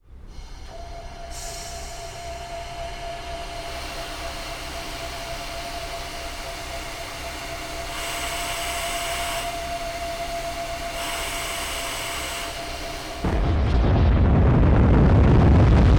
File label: rocket Launch